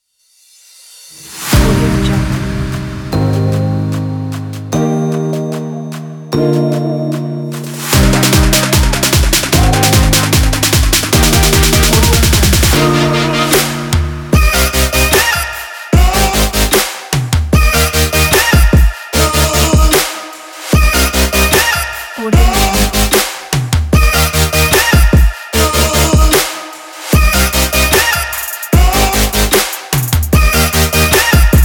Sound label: future bass